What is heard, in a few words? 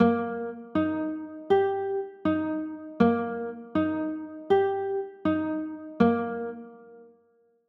e flat maj, chords